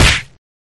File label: super punch mma